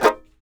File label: TAR; Roland - LA Composer vol. 3